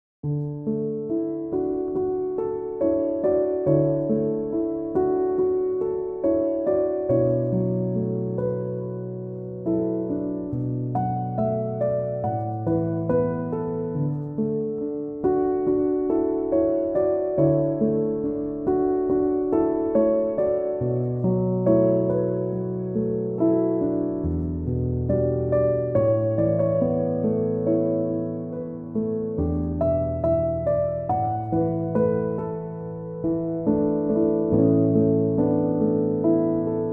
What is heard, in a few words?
piano instrumental